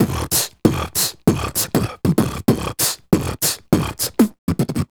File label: Beat Box, Hip-Hop and RnB Vocals